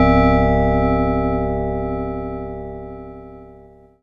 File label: Ensoniq ESQ-1 Tube Bells C2